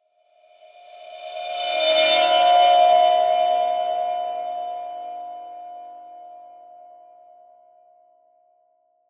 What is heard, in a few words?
Magical Metallic, Production, Element, Magical, Metallic, Swell, Mysterious; Magic Sound Effects; Blastwave